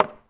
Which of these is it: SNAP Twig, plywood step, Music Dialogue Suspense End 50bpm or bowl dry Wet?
plywood step